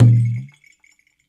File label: IYA ENU B 1H, World Percussion